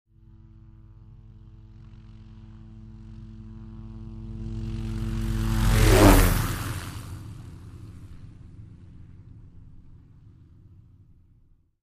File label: Hughes 500 Single By M